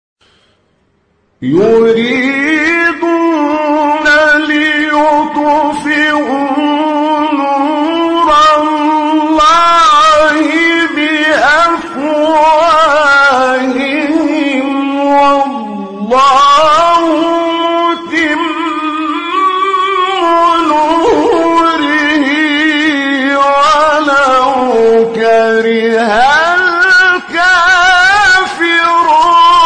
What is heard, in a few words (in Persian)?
تلاوت آیه سوره مبارکه; صف; استاد هنداوی